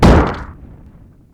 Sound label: Koopaling Pillars